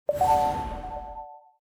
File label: Revive heal